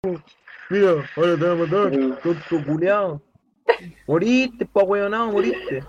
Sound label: rage